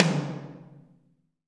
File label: TOM TOM, Roland